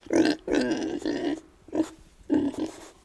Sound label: Pig Snorts Breaths